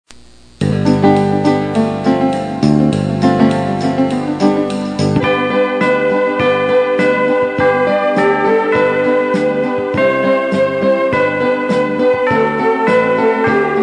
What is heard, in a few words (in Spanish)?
Campanas metalofono G